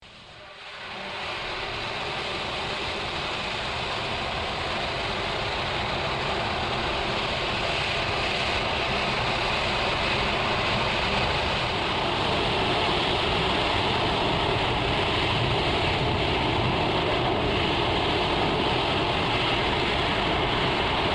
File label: J-2X engine